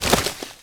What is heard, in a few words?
Hoverboard Land Anim C; Vehicles; Warframe; Venus Hoverboard